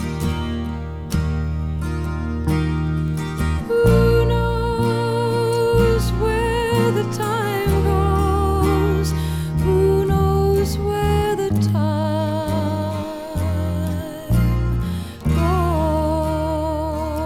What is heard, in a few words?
vinyl